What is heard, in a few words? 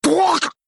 pain